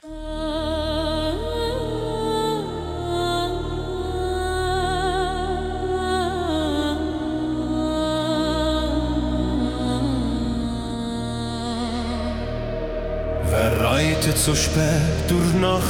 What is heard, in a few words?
Techno